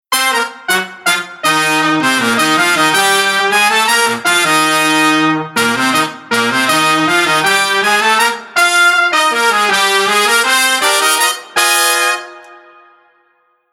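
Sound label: Horn Mix Saturation On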